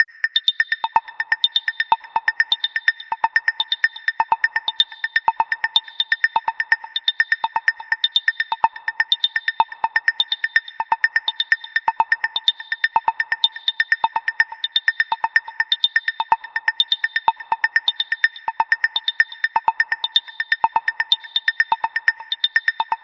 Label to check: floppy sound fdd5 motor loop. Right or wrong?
Wrong